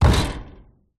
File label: impacts wood thump with metal wire rattle